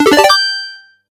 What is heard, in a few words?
jackpot Tune